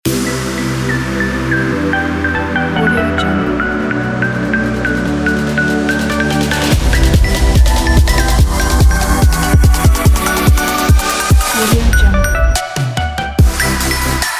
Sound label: future bass is future bass